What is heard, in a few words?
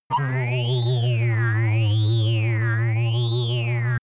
crazy scientist, energie